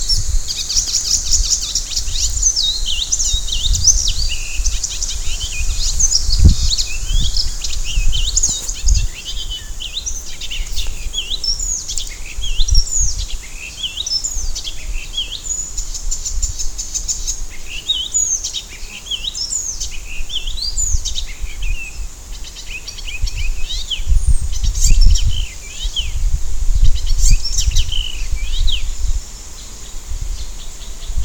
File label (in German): Gelbspoetter, vogelstimmen